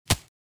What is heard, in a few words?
Bullet hit body impact